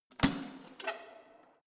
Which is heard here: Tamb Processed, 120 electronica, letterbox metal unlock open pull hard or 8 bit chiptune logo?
letterbox metal unlock open pull hard